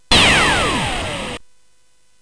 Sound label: Synth Sample, CSG8580R5; hvsc